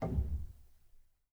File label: rel, Grand Piano; bitKlavier Grand; Piano Bar